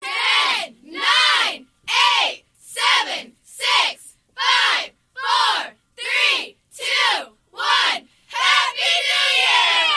new year countdown kids

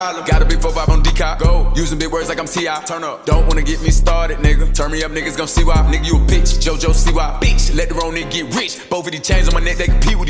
beatbox remix

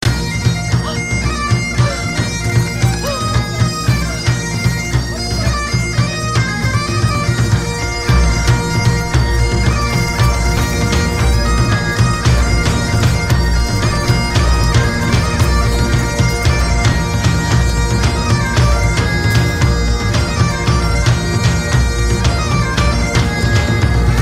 pipes

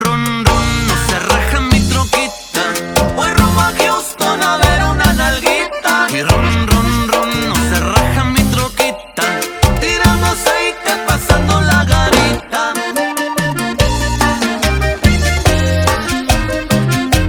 cumbia

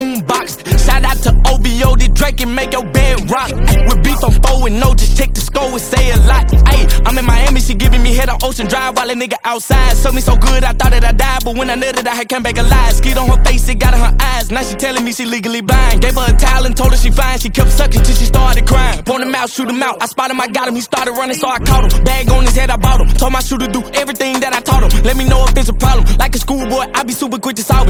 beat box

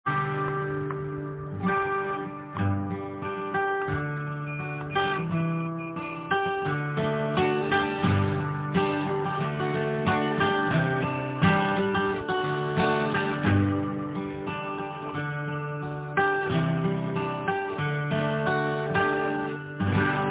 custom guitar

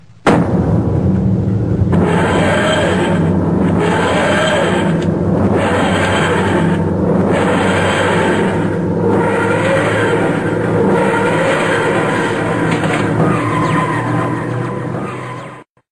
tardis sound

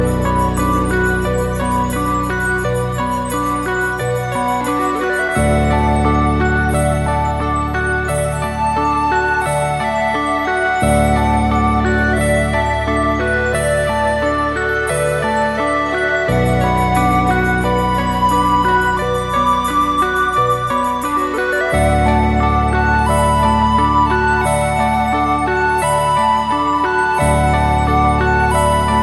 instrumental

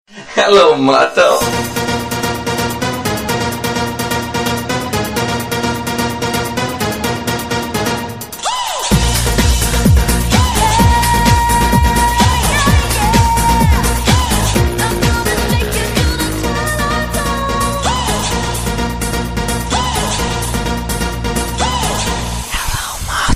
hello moto remix

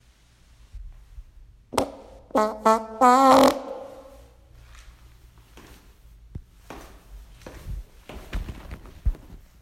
fart notification

